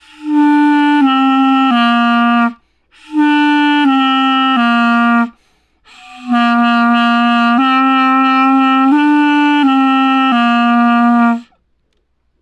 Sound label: Hot Cross Buns Clarinet